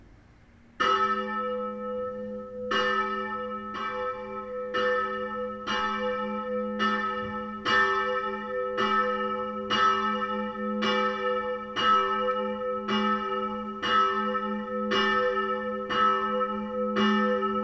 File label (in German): Glocken St. Mariae Geburt as